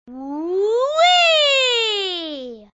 wheee

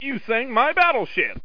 IMP DEAD

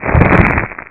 Flame; freetrading

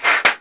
cock; quake; halo; player

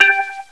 time count ding

